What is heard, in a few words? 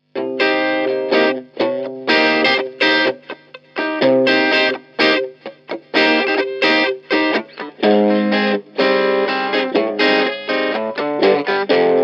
division drive rythm